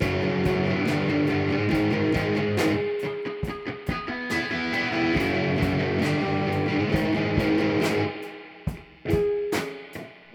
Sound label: Metal 2 Bar Riff